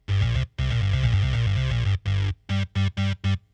Nord Lead 4; biribi

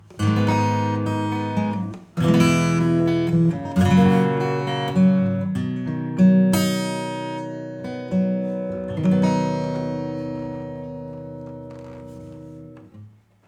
acoustic Glass Room; Seventh Heaven Professional; Room 1-18

